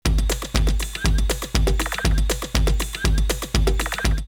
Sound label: Insects Next! 120, drumloops, Rave; X-Static Goldmine; 90 s Sample CDs